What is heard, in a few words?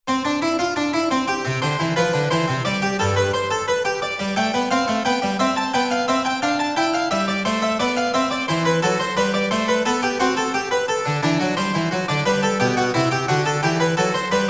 Harpsi; J.S.Bach; invention No.1